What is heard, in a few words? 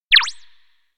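electronic fly piyoi; aino; anemoi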